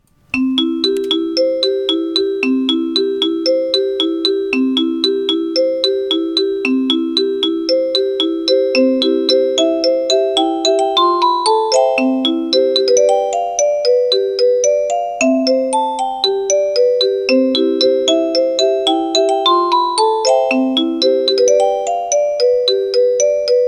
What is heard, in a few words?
kalimba